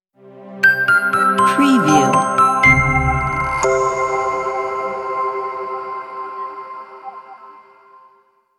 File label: intro logo